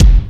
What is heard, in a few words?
mid range bass drum single hit F key, Royality free bass drum single shot; kick drum samples